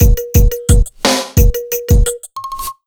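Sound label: 175 UK beat, Ragga & Dub Reggae; USB Soundscan vol.27; AKAI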